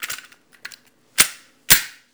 Gun Handling Weapon, Weapons Foley; SFX Kit

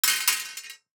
DICE on Metal, Throw and Roll, Mini, Three Dice; Samples; ArticulatedSounds; DICE Sfx Party (Extended)

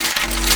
Gun Draw Select Scifi, Weapons Foley; SFX Kit

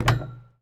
Street Ball Glass Rim; SFX Kit

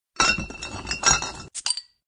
Beer grab; RadwinIsland

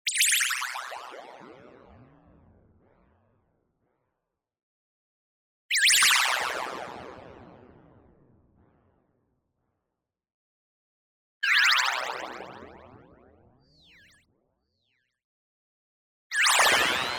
TONAL Chirp Fall; boom library; Anime Essentials; DSGNMisc

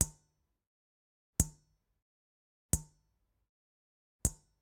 Generic Click Glass Small; boom library; The Interface

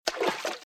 Footsteps - Water - Ankle Level; RadwinIsland

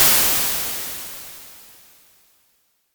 imp, Impulse Response

